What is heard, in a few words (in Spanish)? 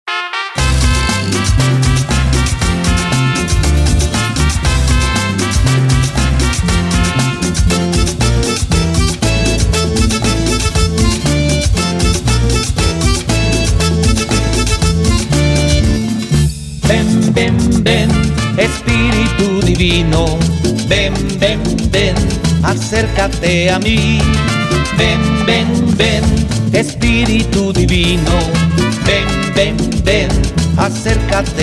Cumbia